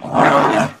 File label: cub hurt; minecraft; technic